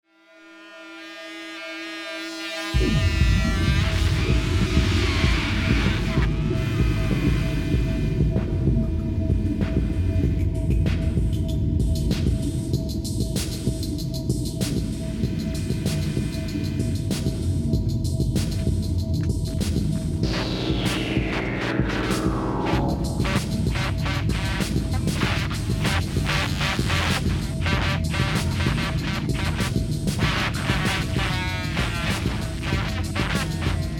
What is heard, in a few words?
bark on brok